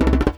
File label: Djembe Fill; percussion; musicradar